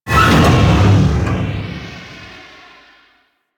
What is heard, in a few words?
Crew Ship Core Reveal End; Warframe; Corpus; Railjack